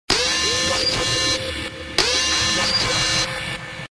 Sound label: Danger Alarm Sound Ringtone; android or iphone mobiles ringtone